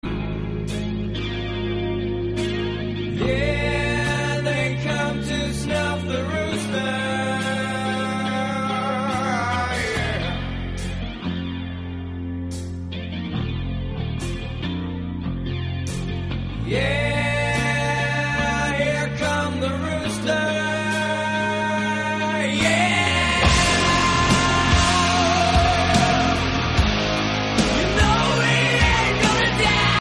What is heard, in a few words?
Rooster